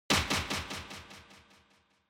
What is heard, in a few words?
Motion Grab Delay